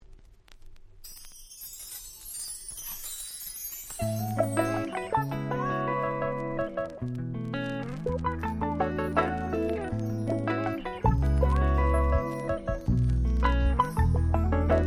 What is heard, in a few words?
break